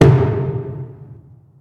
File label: fire; smite; god raijin